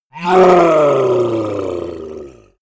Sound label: yeti growl angry painful; kreaturen